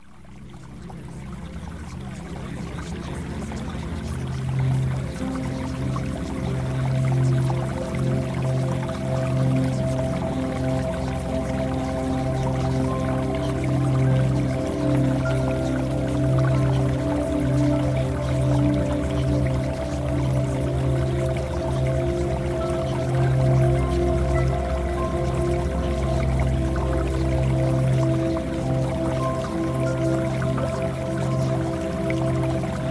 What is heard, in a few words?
Music; Premium Subliminals